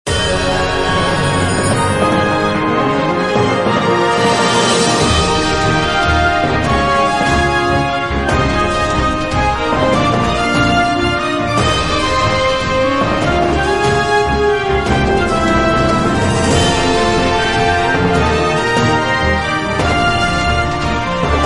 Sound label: overture